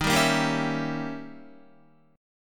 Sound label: Eb 7th Flat 9th, Eb, Guitar; 10,10,10,12,13,12; Eb7b9 strummed; JGuitar